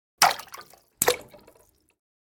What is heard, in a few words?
Pooping poop defecation ploppy drop